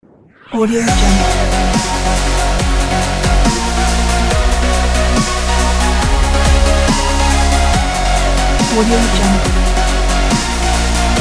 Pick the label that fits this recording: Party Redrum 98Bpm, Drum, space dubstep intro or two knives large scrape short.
space dubstep intro